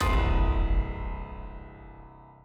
Horror Impact

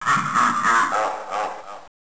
ghost laugh; DKP

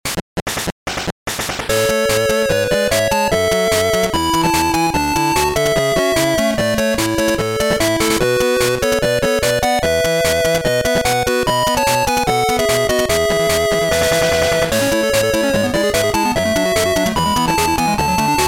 Golf Main Menu

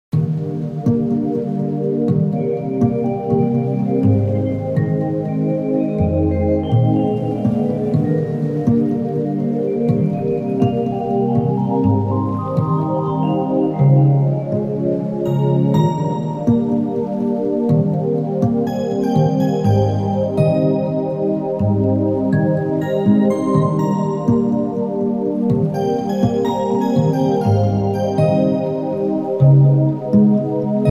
Underwater; Bubblaine; Super Mario Odyssey

